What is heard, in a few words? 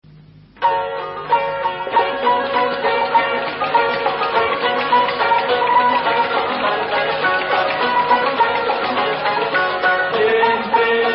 Turkish